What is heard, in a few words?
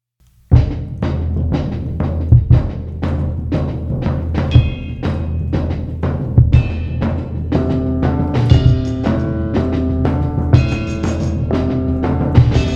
Tangled Synth Web, synth pop